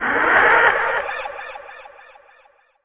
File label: phoenix hurt, utterance